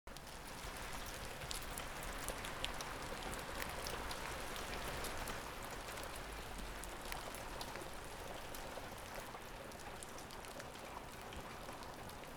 rain; alcuni suoni acufenici

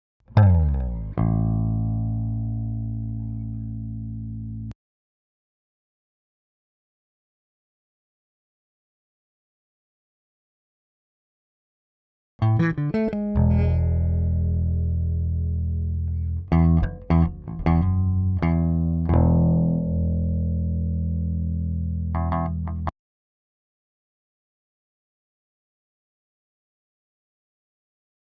Bass, drumfill

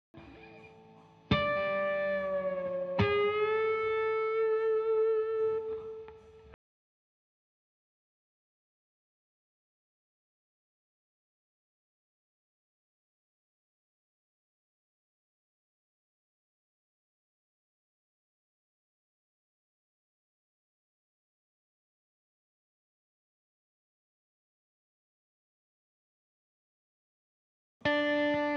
Melody clean

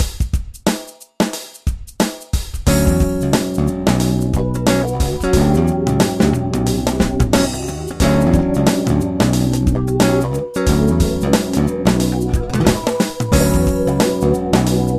intense

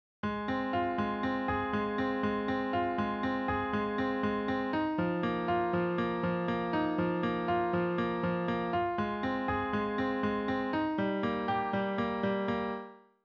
Steinway Grand Piano

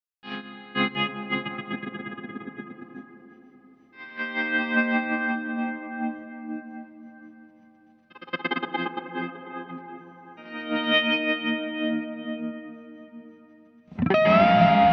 guitar

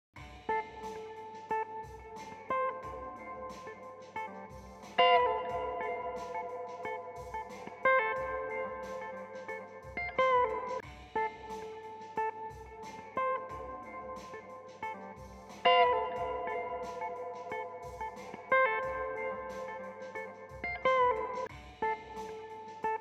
guitar

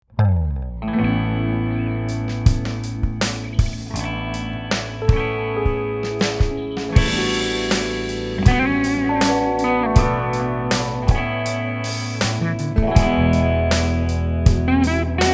drum fill

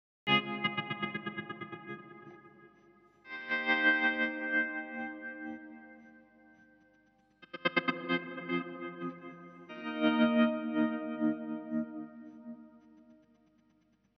guit

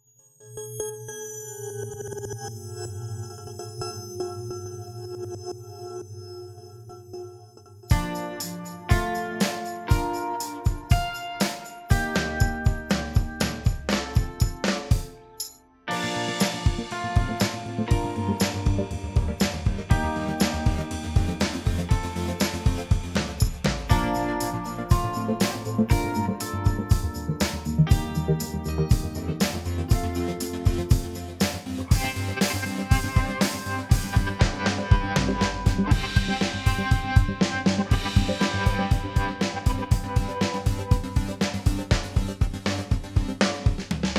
Drifting Pulsations

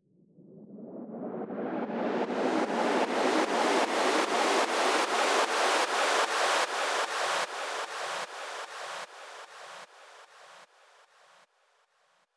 Dubstep Riser FX

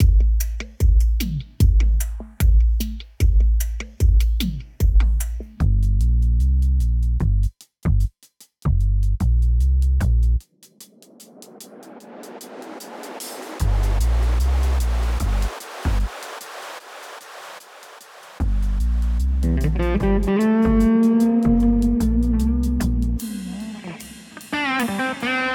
Deep Round Synth Guitar

